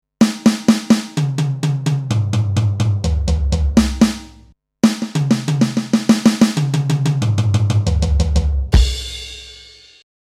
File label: Donner DED 200 Drum Fill